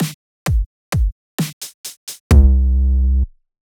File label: snare kick kick snare hihats 808